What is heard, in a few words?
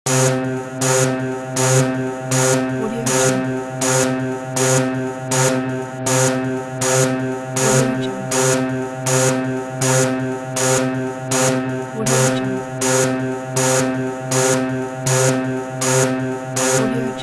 futuristic security alarm; industrial sounds